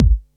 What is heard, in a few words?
BD, Dance Mega Drums; Best Service